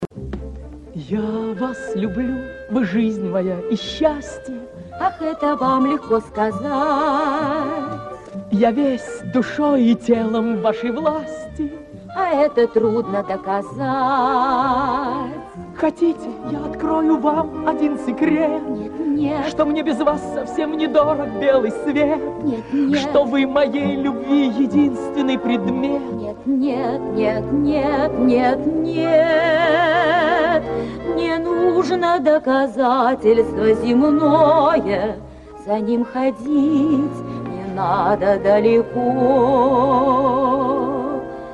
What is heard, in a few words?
duet